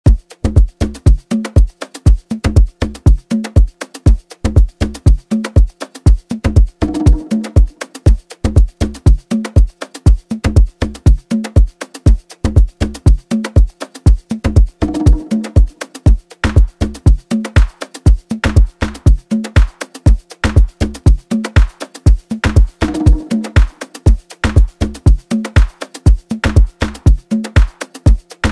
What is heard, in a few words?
organic house afro house